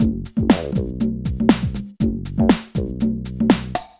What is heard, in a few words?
Loading Loop